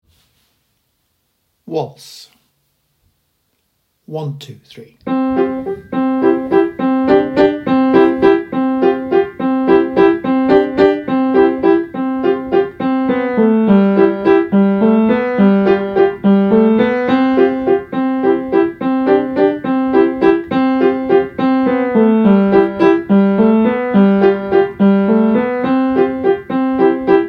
waltz

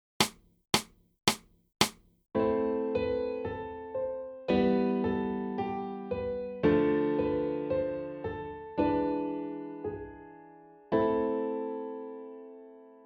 a minor root position

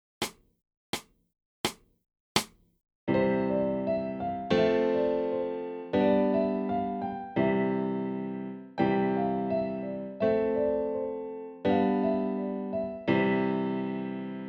primary triads

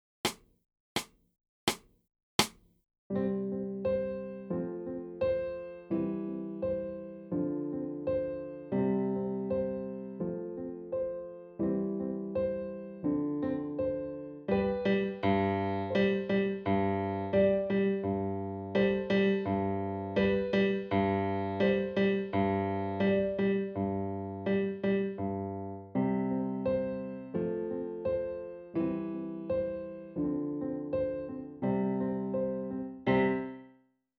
OCTAVE WARM UP

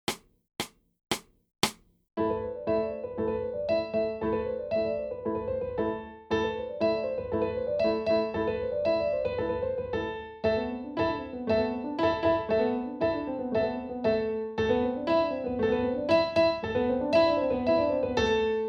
semiquaver etude

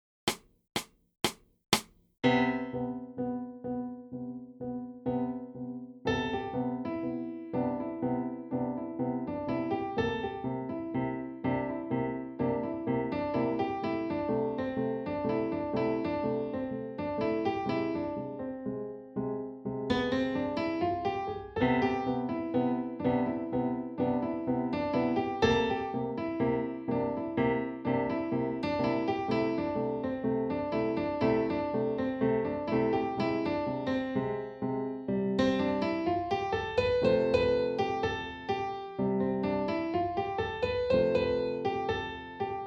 SYNCOPATED WALK

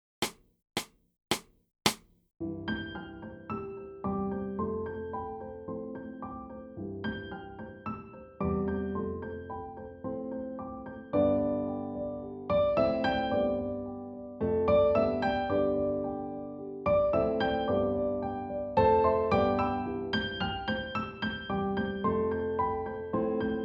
MORNING CHORUS

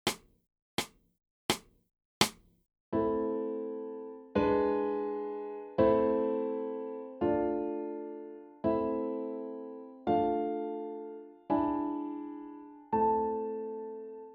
Am CHORD PROGRESSIONS

